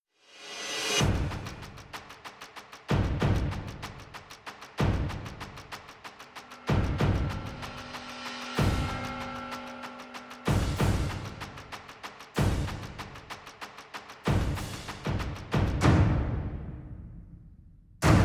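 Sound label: percussion only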